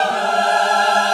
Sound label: choir